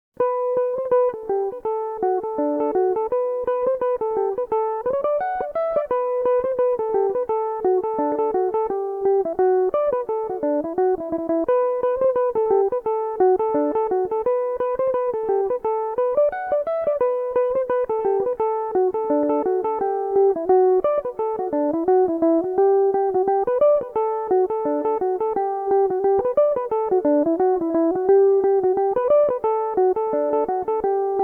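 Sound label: E minor, Irish mandolin; Brendan McMahon's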